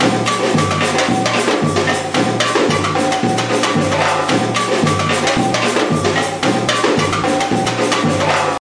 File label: 112 bpm, street procession band